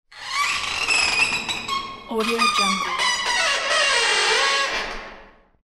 door screech

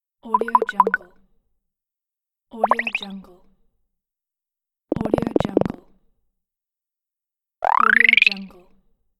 pleasant gameaudio; audioefx

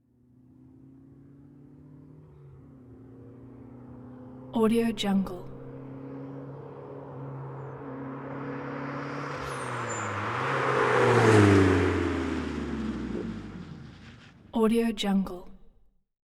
turbo racing car fast pass by with shift and stop

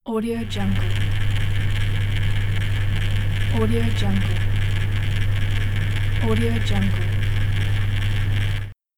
electric box voltage sound

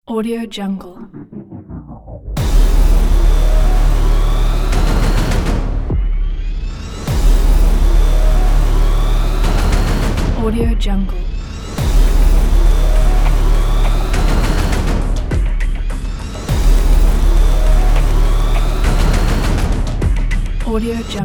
soccer

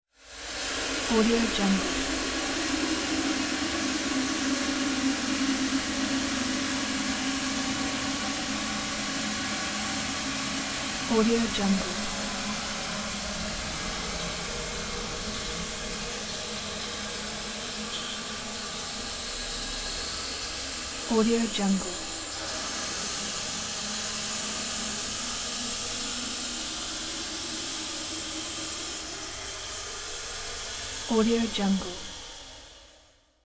industrial sink jets spray water

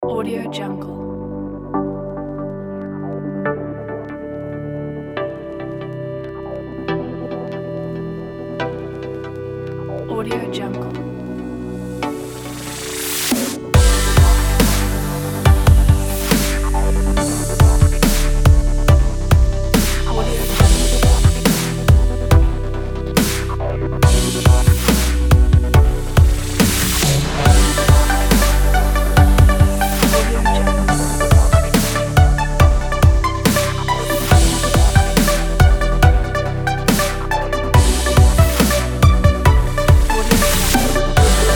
sunset dubstep kit

